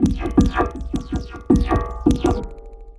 Klong Ya Fire Spit, Worldly Rhythms; Sonic Foundry (Sony Creative Software); Ma Ja Le Ethereal Textures

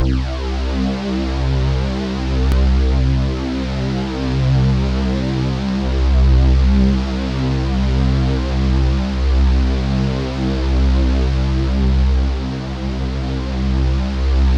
C2, Synth Line; 90 s Sample CDs; NiceBeats; SynthLine VOL-18

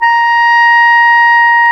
WND CLAR2, Clar Jazz

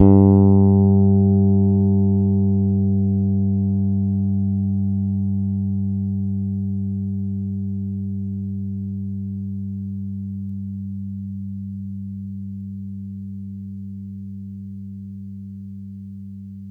Gs G3 hard, Bass, Pedulla Thunderbass; Giga Samples Collection

